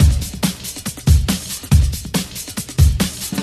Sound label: Breakbeat 140 BPM